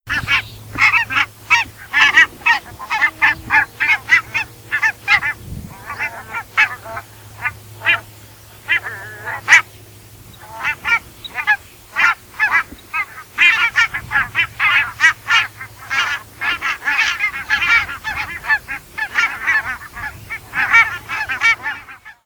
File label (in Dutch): brandgans roep